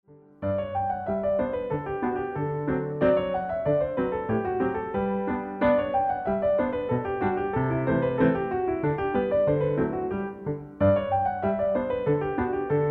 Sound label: Jete medium, music for ballet class